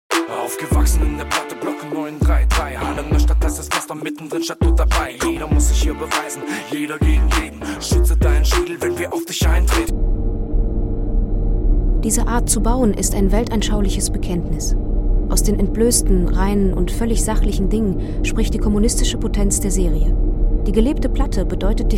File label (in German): Rap